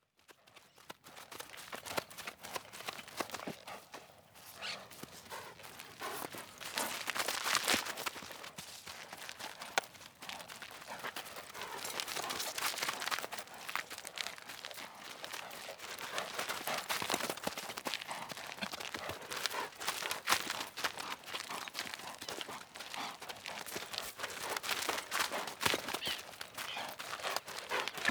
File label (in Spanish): CABALLO alrededor del micro paso y trote respiracion estribos y se va